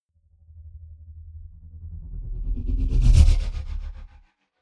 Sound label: sci-fi vehicle drive by tonal flutter; raumschiff